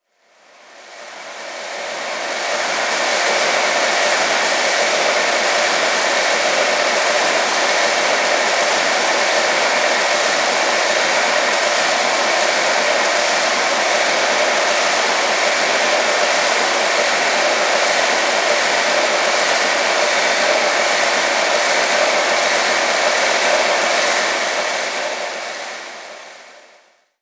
Trap Ambience, Background Ambience; FX; Cymatics; Cobra Hip Hop Sample Pack

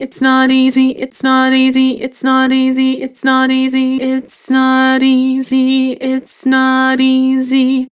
Vibrato, Vocals; Its not easy; Harmor Vocals

